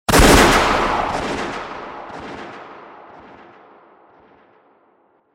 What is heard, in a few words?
gun